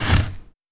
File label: Sand gravel